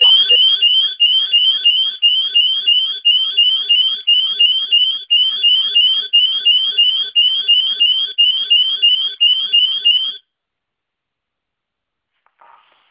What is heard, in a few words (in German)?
Alarm Einsatz